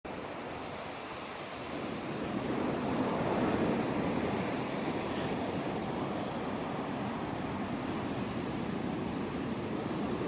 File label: dunwich morning sea soundscape; uksm